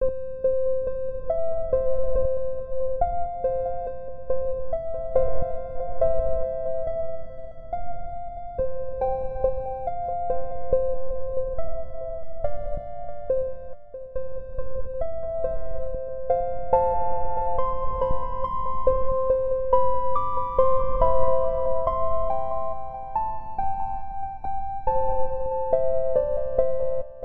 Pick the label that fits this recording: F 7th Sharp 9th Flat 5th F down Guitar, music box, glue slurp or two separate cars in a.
music box